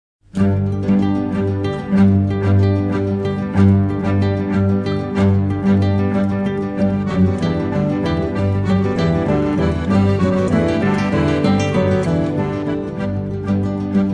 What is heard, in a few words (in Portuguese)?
Guitarra